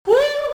rpg7 fire; STALZONE

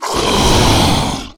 bloodsucker attack; STALKER; customnpcs

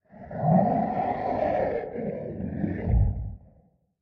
Small ambient fog; STALZONE

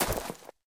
gravel, step; STALZONE; minecraft

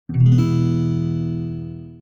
E accord, guitar; STALZONE; customnpcs; stalker

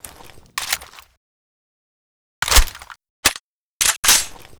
rpk74m reload; STALZONE

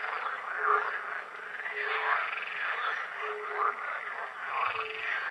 Small ambient frogs; STALZONE

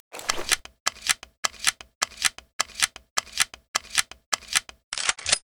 SPAS reload; STALZONE

